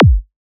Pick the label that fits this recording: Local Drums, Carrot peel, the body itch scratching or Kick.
Kick